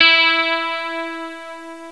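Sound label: clean guitar; jazz jackrabbit 2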